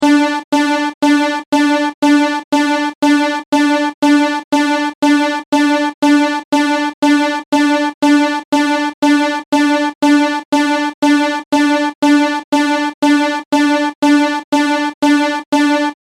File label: Alarm Loop; electronic